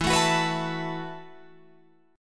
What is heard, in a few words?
E Suspended 2nd Suspended 4th E, Guitar, Open F; Esus2sus4; strummed